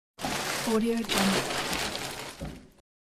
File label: plastic bag; jw-production